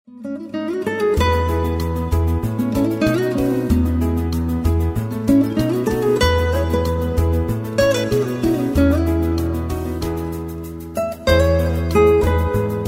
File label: Acoustic Guitar